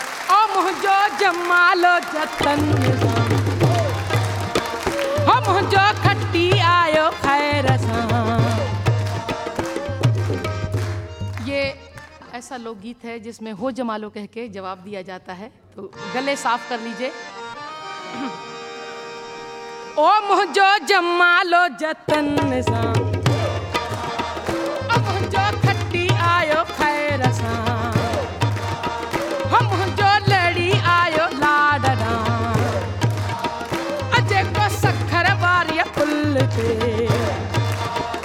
Ho Jamaalo! (Folk Song)

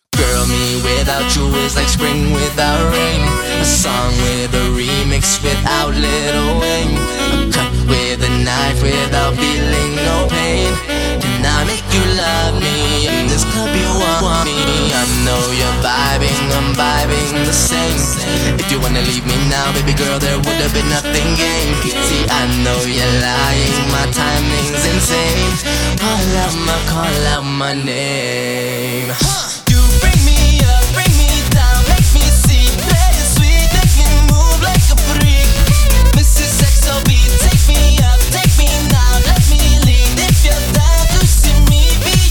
dub, saxo dub

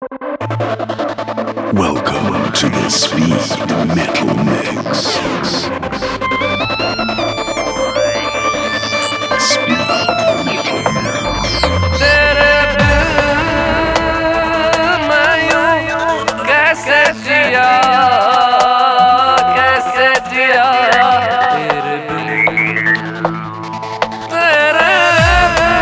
Speed Metal

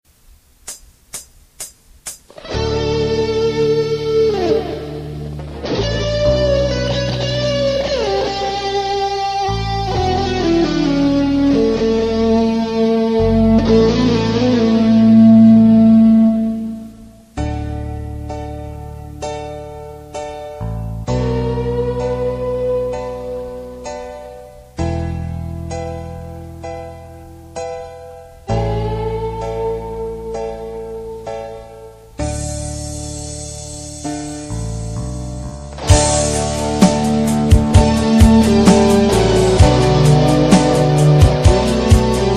Guitar (Instrumental); Sholay

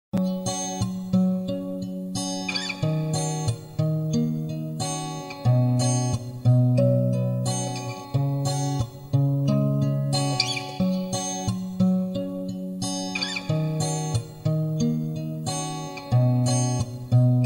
Acoustic

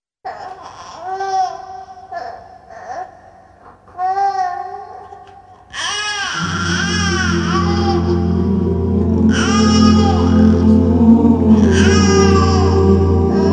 Naat

